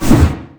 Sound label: pre attack; weapons; dota; huskar